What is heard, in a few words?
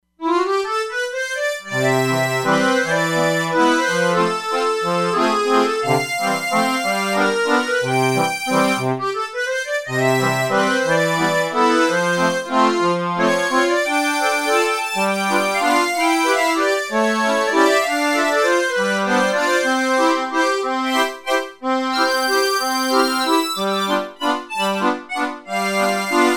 Paris Musette, E-mu Proteus-3; Factory patches